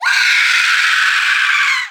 Undead Scream; overlord raising hell

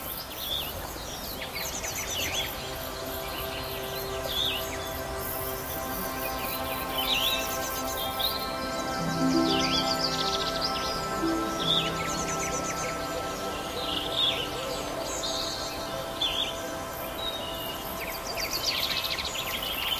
Summer Ambience; Angry Birds Seasons

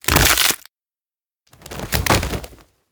Jump Scare Neck Break; The Town

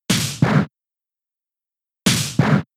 Double Hit 2 hits; pokemon sfx gen 6 attack moves xy oras

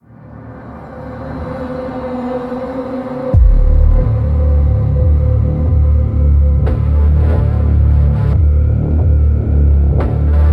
Main Ambient; Doom Eternal; PS4; Stadia; Xbox Series X/S; Blood Harvester

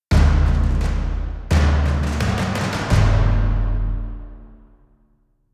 Combat Out; Novigrad

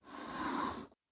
slide away

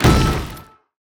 Loop Impact; Necromancer Skill1; Portal Quest

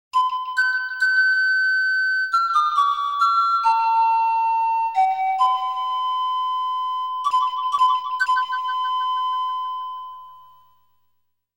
Ocarina; Ocarina of Wonder

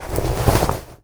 accelerate foley

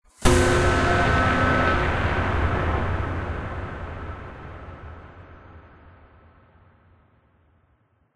Short Sting; Solus Project

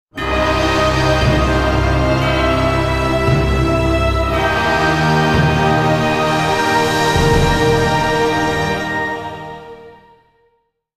Win Battle; heroes of might magic v gamerip 2006; Necropolis

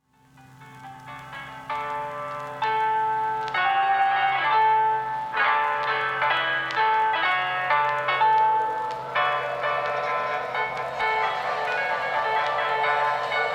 Sonata For Two Pianos; bayonetta; Angelic Hymns

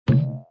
Bubble Bounce; Angry Birds Space

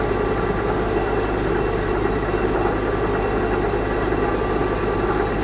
Engine; In the Line of Duty Firefighter

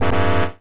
Incorrect; quiz tonosama no yabou arcade

